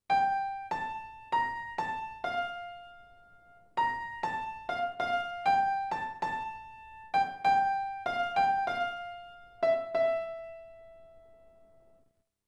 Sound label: ending piano